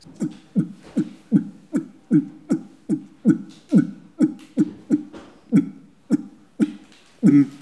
bruit animal

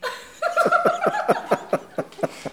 rire foule

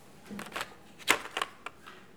bruit page

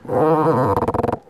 frottement

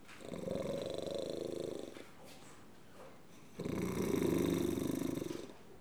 ronflement

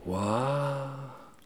ouah

